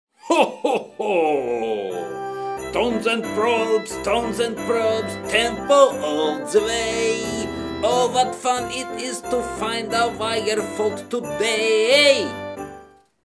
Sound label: CHRISTMAS SONG Jingle Bells; TEMPO BOB